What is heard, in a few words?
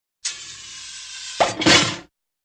inflate pop and land